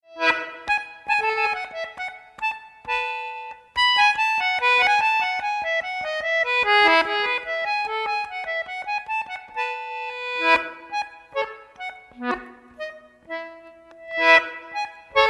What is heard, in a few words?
Gavotte